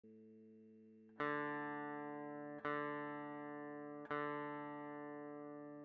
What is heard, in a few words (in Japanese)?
4弦の「D」